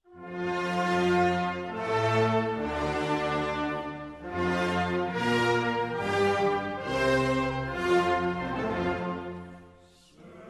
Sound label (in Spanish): Himno Nacional de Grecia; Griego Español